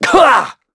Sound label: Vox Damage; kingsraid; en